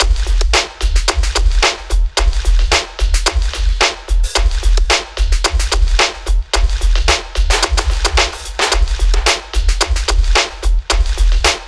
junk hip hop 110bpm

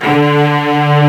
Strings G3; Best Service Gigapack 1

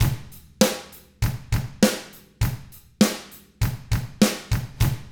ROCK, Drums; ILIO; Double Platinum Drums 1

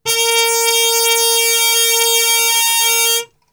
A#2, Brass Super Section; Best Service; TRP HARMUTE; 90 s Sample CDs

